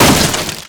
Pirate Birdnest

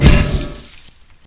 Door Thud; Burnin' Rubber 5 HD

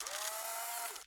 Camera-Broken Print; Viewfinder; Xbox Series X/S

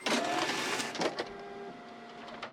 Photocopier Print

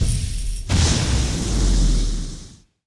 Final Boss Chain Break

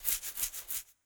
Ara Parrot Scratch; Legend of Keepers

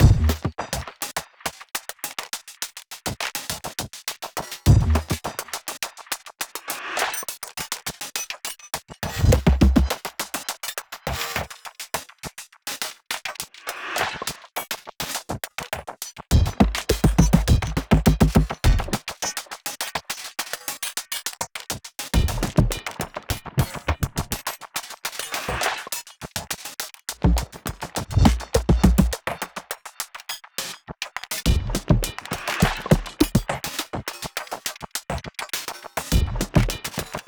music drums Fight; music ftue drumsFight 08

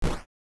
Character Shoot Hit

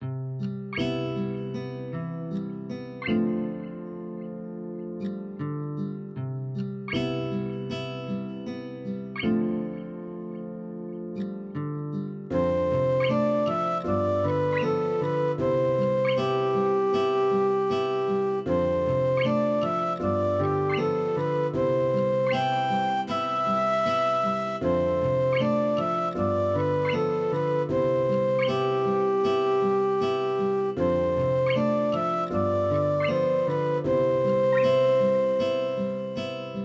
Raindrops and Puddles; Katawa Shoujo; Enigmatic Box of Sound

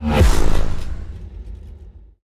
Battle Drivers Use; starbites

